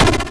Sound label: Replay